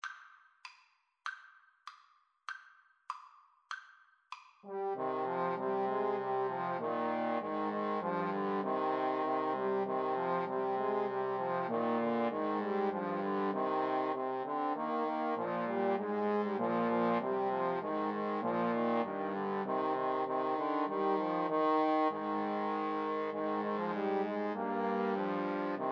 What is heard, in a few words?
huron carol, Trombone Trio